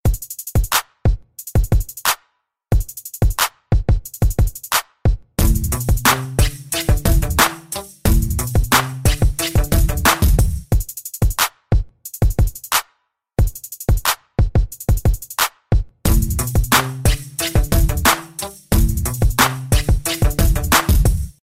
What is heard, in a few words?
Ghetto Hip Hop